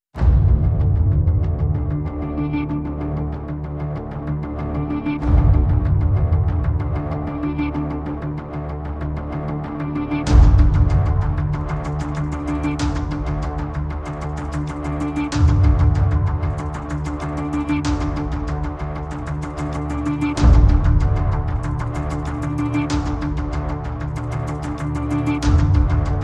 No Strings

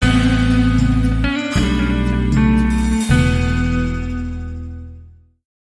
This Old Man

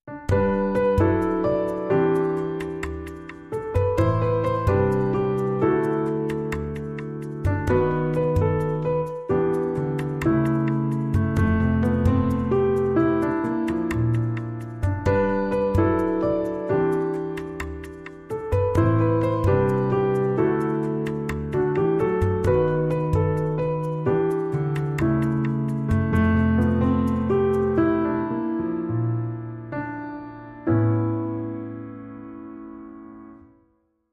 Piano Theme; 30sec

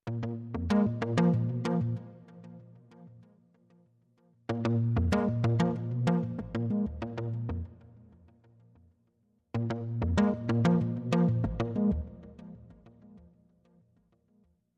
Pulses Only

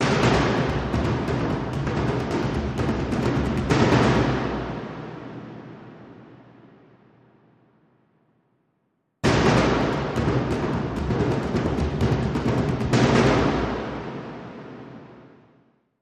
Loop Layer Dhol Hand

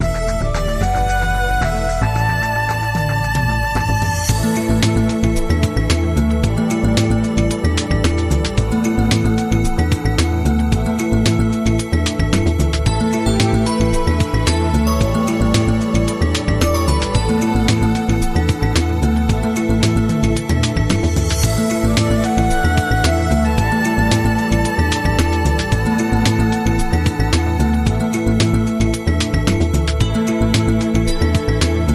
Globe Watch Promo

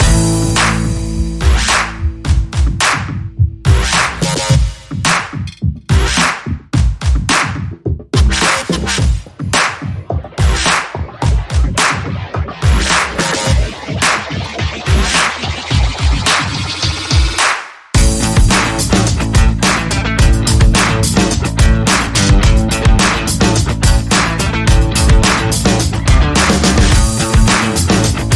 No Lead

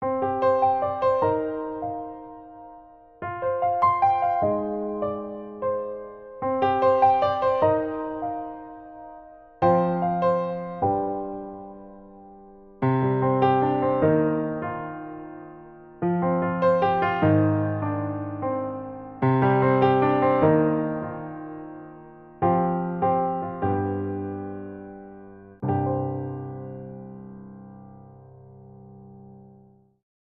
Introspective Piano Etude

